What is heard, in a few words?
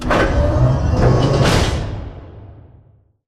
Robot Arm Left Deliver Close; Boomtown; Titanfall 2